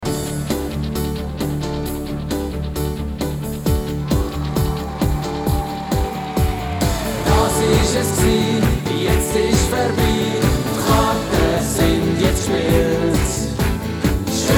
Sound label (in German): Klingelton short